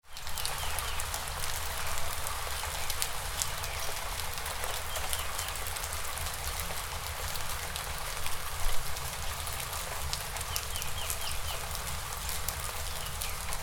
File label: Gentle Rain